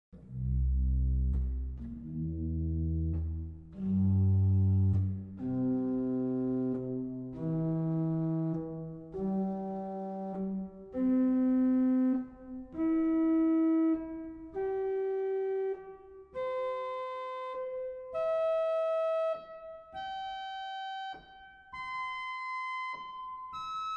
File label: Montre; CavColMainz